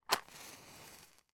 household match lighting strike box

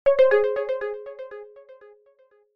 multimedia alert system

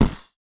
musical human beatbox kick drum

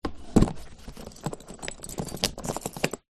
foley case old vintage small close lock latch

